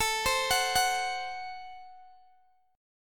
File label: A Minor 6th A, Guitar, Open G